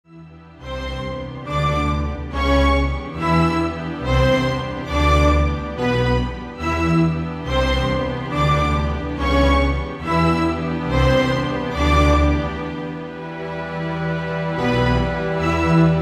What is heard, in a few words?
march